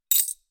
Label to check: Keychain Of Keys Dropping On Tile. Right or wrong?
Right